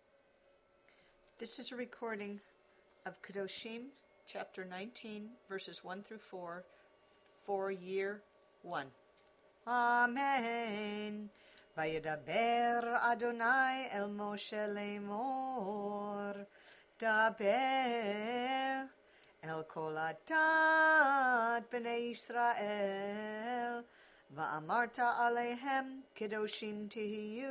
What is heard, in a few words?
Torah